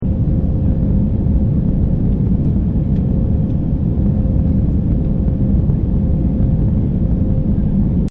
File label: Airplane Ambience; Airplanes; Vehicles; Partners In Rhyme